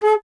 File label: Alto Flute; Miroslav Vitous; Solo Instruments